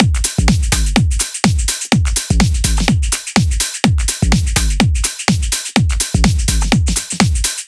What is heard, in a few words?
Drums